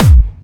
Bassdrums Clubby Kicks; Vengeance Samples

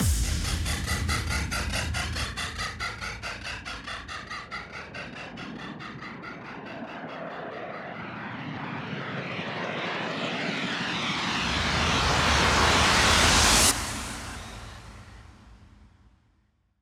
140BPM Reverse FX 8 Bars; Vengeance Samples; Snares&FX Pairs

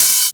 Open Hats, Cymbals

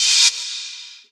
Cymbals Reverse Crash; Vengeance Samples

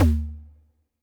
Percussion; Vengeance Samples

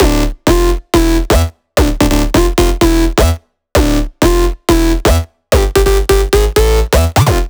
128BPM Melody; Vengeance Samples; VFH2 128BPM Automata Kit

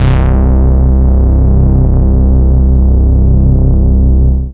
Basses C; Vengeance Samples

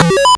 Arcade Riffs

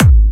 Bassdrums UK Trance Kicks

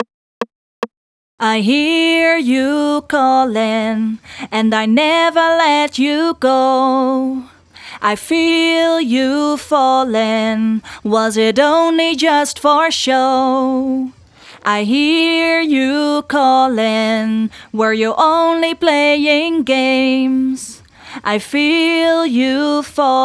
Chorus, 145 BPM; Vengeance Samples; Hear You Calling

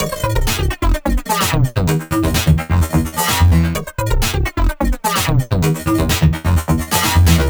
128BPM Tribal Kiss Melody; VFH1 128BPM Tribal Kiss Kit

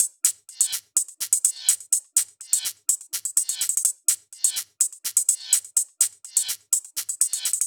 125BPM Kit; VFH1 125BPM Minimalsau Kit

